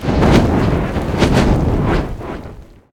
Win Fire Burst; aloha

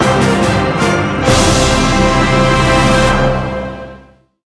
large Win; excalibur

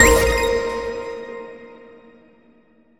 lucky reel three; jewel fruits

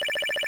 total Win Countup; reel rush

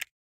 button Hover Away; rome

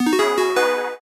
basic Joker Win; mega joker

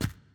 reel bounce; the wolfs night

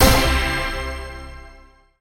scatter short; spinsane

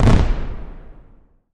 firework Explosion; shangrila

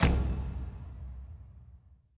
button Click; mercy of the gods

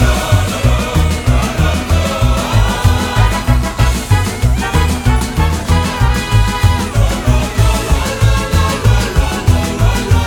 big win loop; willyshotchillies

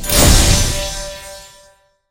Butterfly Frenzy Pick Large; butterfly staxx 2

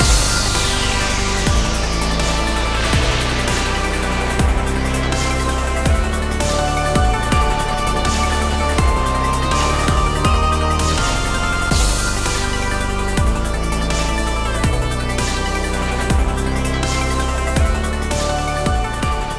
big win start, butterflystaxx

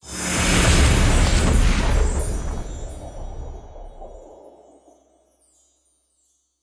super Mega Win Attention; excalibur

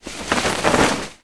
ship Flag; rage of the seas

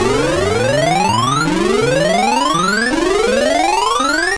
big Win Loop; reel rush

